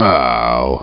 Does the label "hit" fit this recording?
Yes